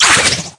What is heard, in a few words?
slide; Blackgarlic cookie; cookierunwiki